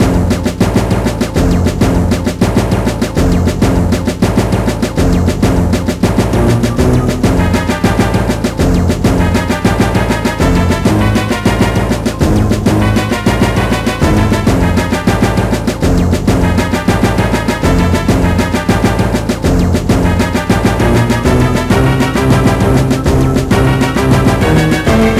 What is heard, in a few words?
Mini-Boss Battle; Unused; CT Special Forces 2 Back in the Trenches; GBA